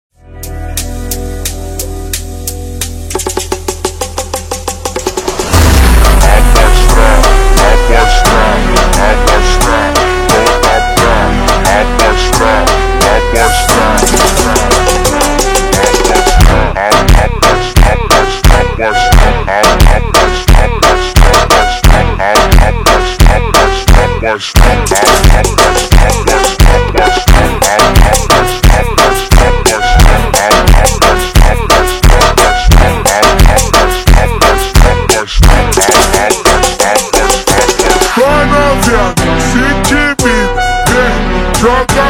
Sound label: FUNK SUPER SLOWED